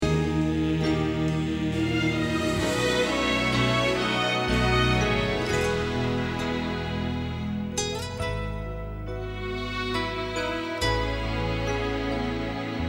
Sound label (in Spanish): Bajo